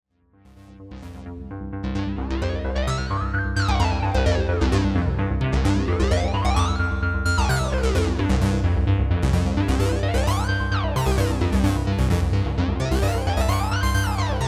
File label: Oscillator Sync Mode